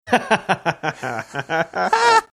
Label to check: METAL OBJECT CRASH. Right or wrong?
Wrong